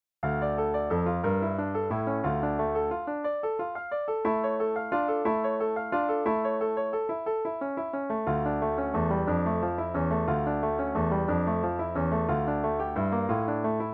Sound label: Scherzo in d minor (no click, en=180); Play-a-long; Gurlitt